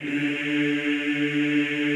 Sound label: EEH D2, Classical Choir; Best Service; Extended Classical Choir